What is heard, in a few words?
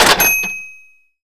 coin